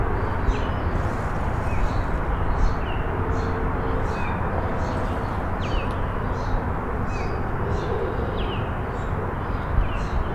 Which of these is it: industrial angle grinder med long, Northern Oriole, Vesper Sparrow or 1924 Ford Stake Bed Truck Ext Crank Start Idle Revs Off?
Northern Oriole